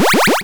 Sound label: start; orni attack